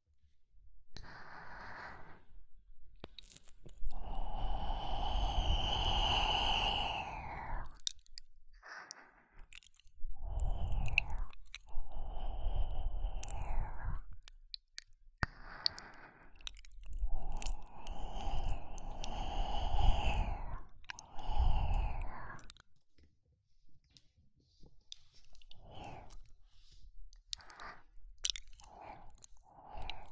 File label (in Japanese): れろれろ; Vol1特典 れろれろ30min